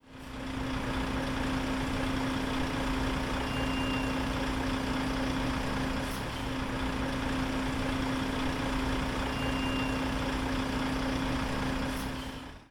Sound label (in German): Autos stehen